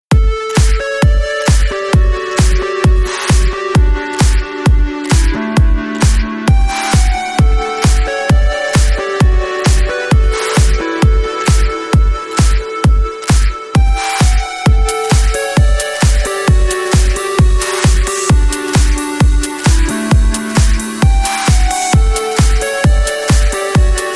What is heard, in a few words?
Melody idea; Pony Music Archive